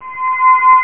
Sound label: Activate Photon; DreamLight Verttice™ 1.1